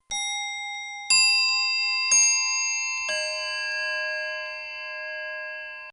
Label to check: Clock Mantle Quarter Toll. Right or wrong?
Right